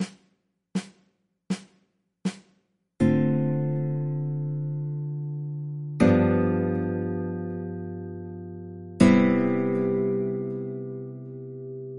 chord progressions ii V I, guitar

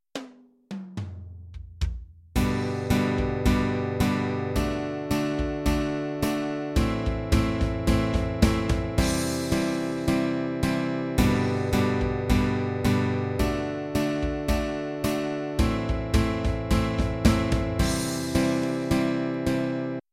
quarter note strumming with drums

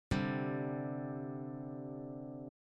csus2 triad

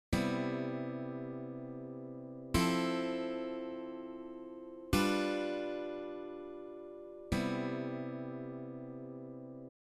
Cmaj7 Fmaj7 G7 Cmaj7, guitar

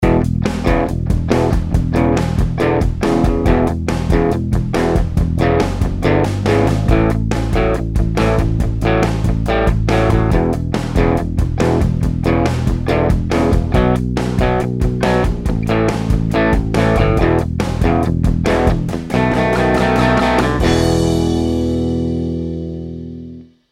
blues ride rhythm complete 140 BPM

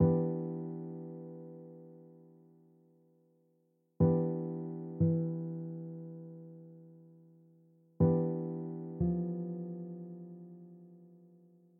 e phrygian vamp; modes; Learn how and why to use the modes in this lesson

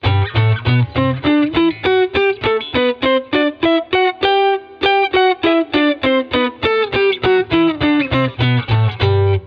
Octave Scale

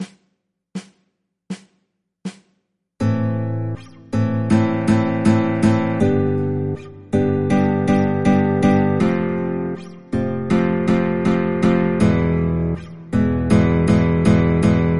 chord progressions flamenco progression, guitar

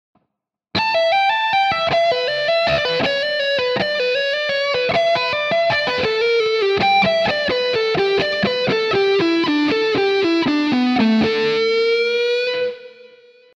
Hard Rock Speed Lick 80bpm, guitar; classic hard rock speed lick in the style of many hard rock/m

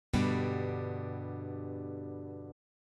figure 16-3 g major, guitar

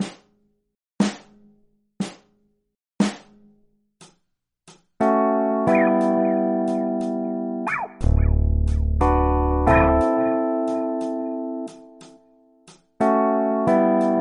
chord progressions 12-bar blues quick change in A

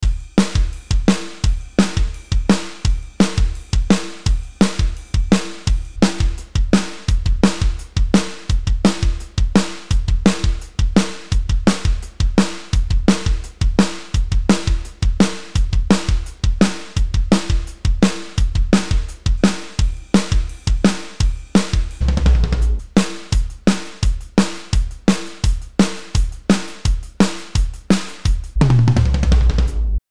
Blues Rock Solo Drums

